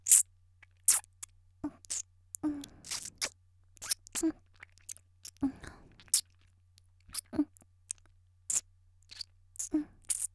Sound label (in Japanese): 「フェラ」控えめ; 「フェラ」控えめ10秒; 綾波系少女